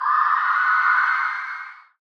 Ghost Death

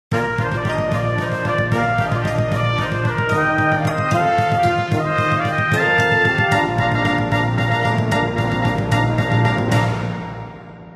Level Complete; Buildalot

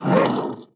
Attack

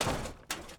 minecart impact

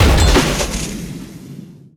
Drone

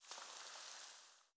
Platform Dirt Fall

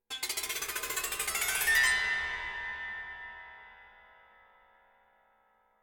Combat Zither Cluster; curious expedition 2 macos ps4 switch windows xbox one xbox series xs gamerip 2021

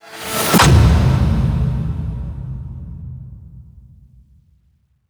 Shutter

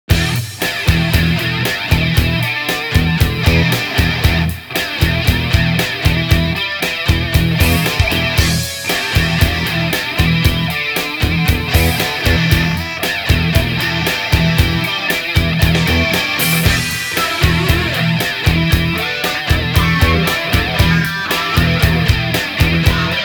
Str G#

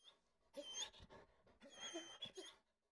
Dog Sad; unsighted

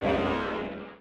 Dark Monster voice hit

